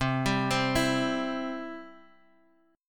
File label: C Major; arpeggiated; JGuitar